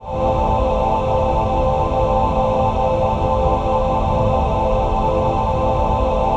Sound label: VOICE PAD, ATMO PADS; Best Service; XX Large